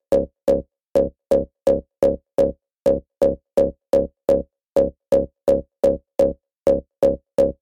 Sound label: 128 Bpm Minimal Synth Stab